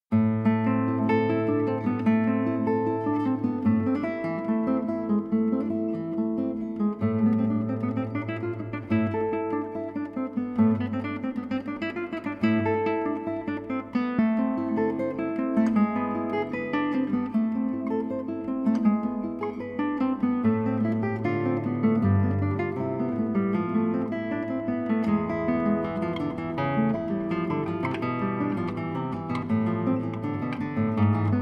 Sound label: guitar version; Johann Sebastian Bach